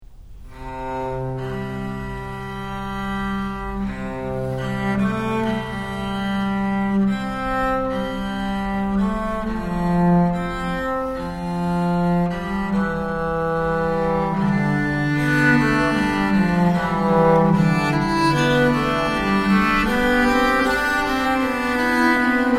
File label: Fugue In C Major